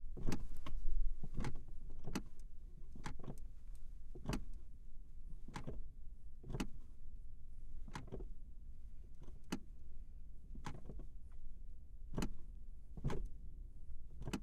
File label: Toyota Chaser Mark 2 INTERIOR pedal